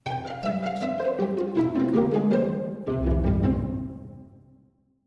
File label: Friendly Ident Strings; SoundsCrate